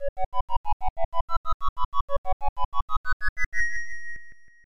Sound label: Special Item Fanfare; magical pop'n